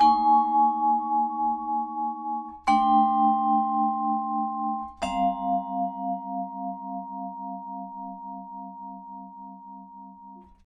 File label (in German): Vibraphon 90 bpm G